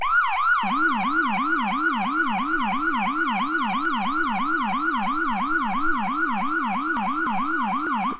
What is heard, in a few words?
YELP & Howler